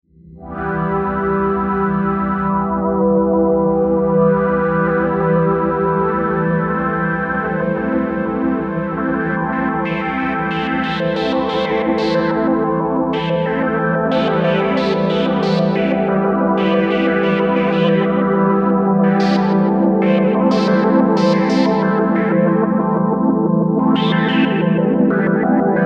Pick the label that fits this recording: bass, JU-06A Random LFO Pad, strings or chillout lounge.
JU-06A Random LFO Pad